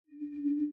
gem Pulse; wild bazaar

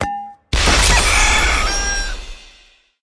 rooster Catch; eggomatic

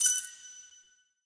count Up End; hellskitchen

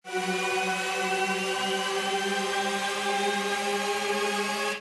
respin snd; jackhammer

